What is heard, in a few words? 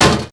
wireframe left, footsteps; characters